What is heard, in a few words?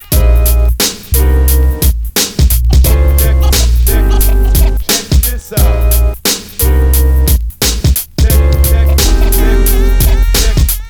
Ditty; NBA Inside Drive 2003; Xbox